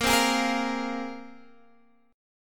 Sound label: A sharp 7th Suspended 2nd, Guitar, Standard; strummed; capo 3; 18,15,18,15,18,x